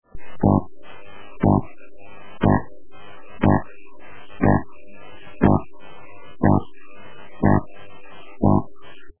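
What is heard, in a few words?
interference 2 MHz home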